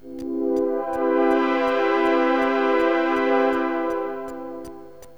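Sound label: major 7th, synth chime; cubase; CUBASIS; MOODS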